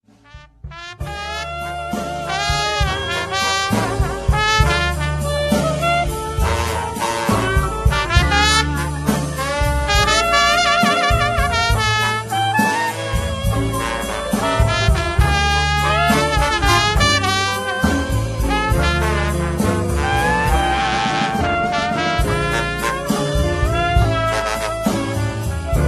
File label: Brass